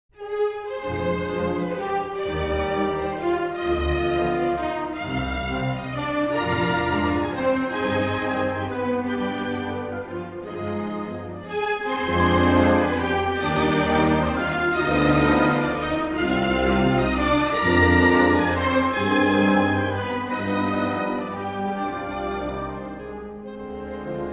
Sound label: Symphony Orchestra; Carnaval - Scènes Mignonnes Sur Quatre Notes